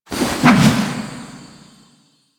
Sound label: Glyph Ball Throw; Warframe; Duviri; Glyph Puzzle